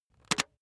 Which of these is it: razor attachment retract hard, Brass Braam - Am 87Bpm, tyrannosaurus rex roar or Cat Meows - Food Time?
razor attachment retract hard